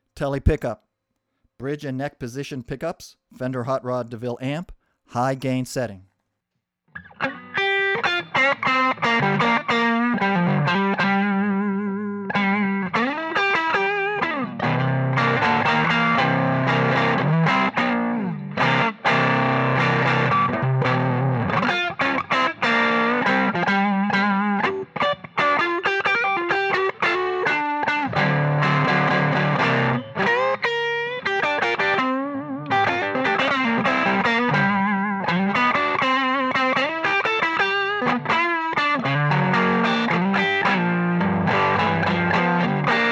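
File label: tele bridge neck hot high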